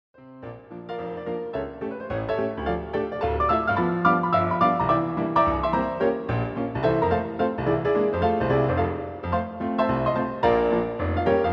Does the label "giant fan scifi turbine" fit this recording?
No